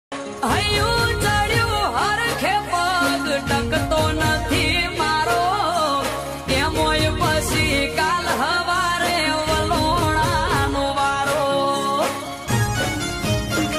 new gujarati ringtone gujarati new song

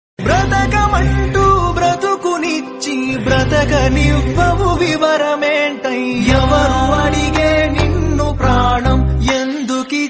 the telugu song ringtone; 777 charlie

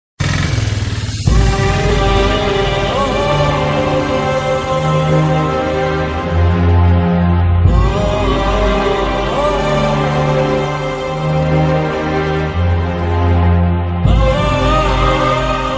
bichagadu emotional bgm music

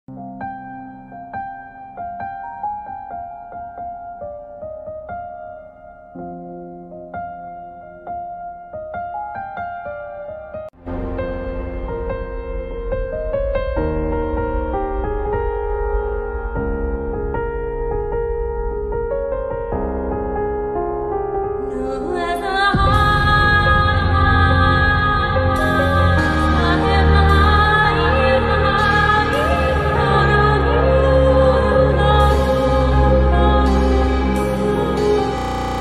movie bgm ringtone instrumental ringtone; ismart shankar movie; ram pothineni nabha natesh

